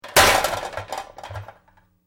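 Metal Medium Impact Settle, Noisy metal junk impact, fall and settle; Tools or metal parts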